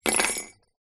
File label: bells sleigh bells down on table